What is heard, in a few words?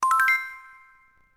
video, shutter; ZTE F555-Aspect